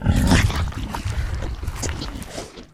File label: flesh eat, monsters